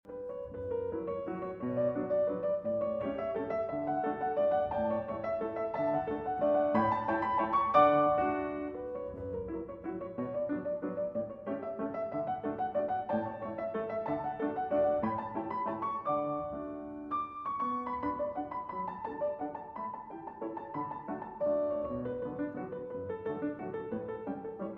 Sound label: German Dances, in B Minor